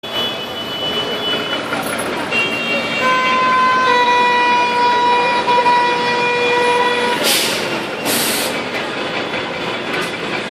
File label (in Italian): rumore traffico